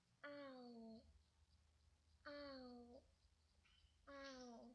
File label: owl